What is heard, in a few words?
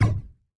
glue swish lock; 星际争霸音效; 星际争霸其他